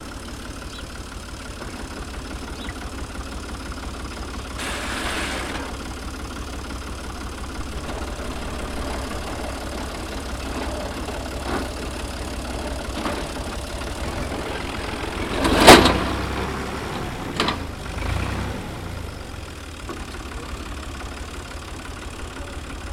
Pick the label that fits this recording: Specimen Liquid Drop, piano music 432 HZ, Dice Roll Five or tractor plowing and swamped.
tractor plowing and swamped